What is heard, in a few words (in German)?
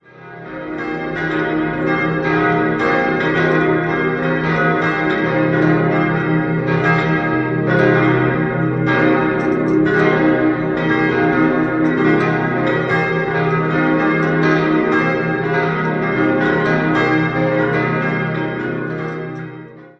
Hohenkammer, Glocken